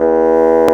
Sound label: Bassoon; CMI